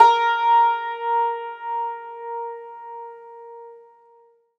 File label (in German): HACKBRETT BB; Alpine Volksmusik